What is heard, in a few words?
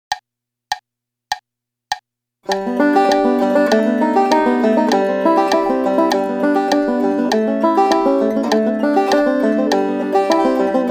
string banjo exercices; bergeron guy